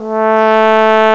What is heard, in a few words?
FRENCH L; CMI Sound Library Rev 1.3; BRASS